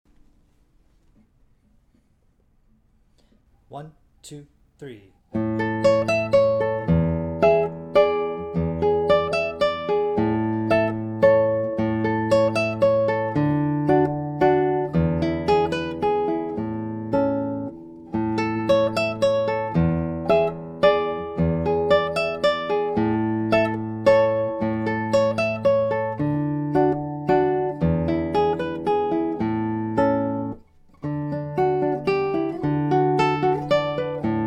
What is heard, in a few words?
Mazurka 112bpm; Mazurka (Duet) by Francisco Tárrega